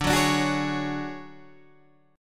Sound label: D Major 7th Flat 5th Guitar Standard, chord; strummed